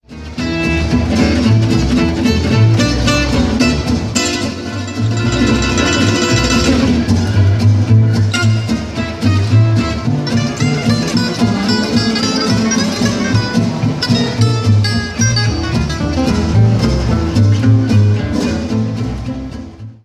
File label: MUSICAL EXCERPT; MUSICAL EXCERPT FOUR